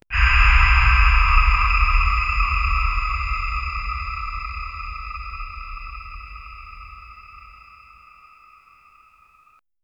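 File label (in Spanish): haz de luz iluminando la, beam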